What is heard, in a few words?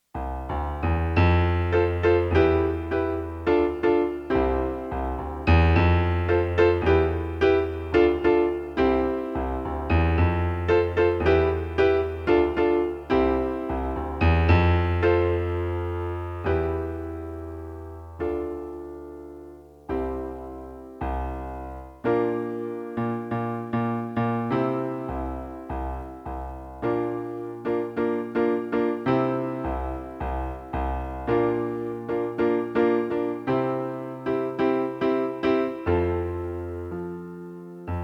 piano; MegaEpix